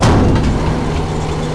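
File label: door warehouse start; doors elevators; Star Wars Obi-Wan; XBOX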